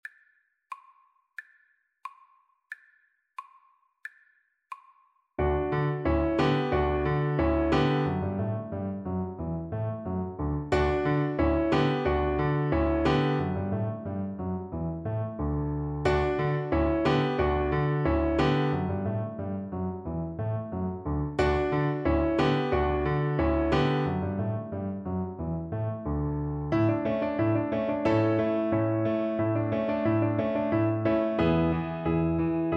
bach musette, Double Bass

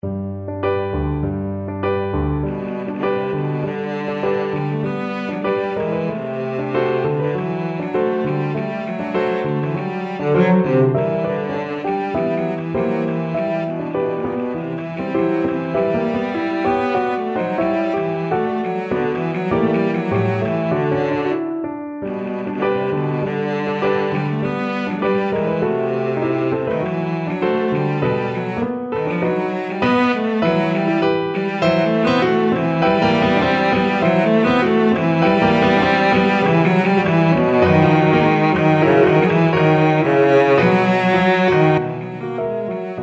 albeniz tango, cello

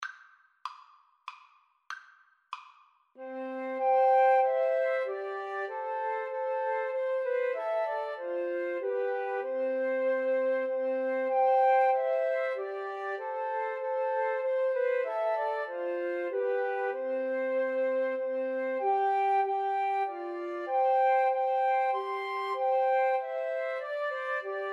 Christmas Hymn FL trio, Flute Trio

